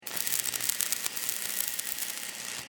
Industry Welder Run Sparks Short; Welders; Machines

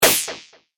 Future Alien Assault Gun, Laser Gun Sound Effects; Twistedtracks

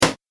Arcade Game Bang; video game; retro inspired; Arcade Game Sound Effect; Think Nintendo, Atari, C64, Etc.

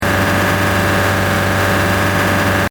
Engine: High Revs Loop, car; 8, Diesel; Cars; Vehicles

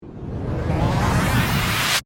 Power Up Reverse, Suction, Tonal, Digital, Ascend; Production, Element, Imaging, Accent, Transition; Tech; BlastWave

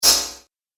Metal Magician Hit; Magic Sound Effects; Andrew Fleming; Twistedtracks

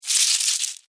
Insect Cockroach Scurry Movement Short; Cockroaches; Animals; SFX Bible

